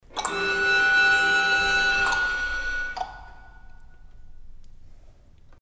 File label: string quartet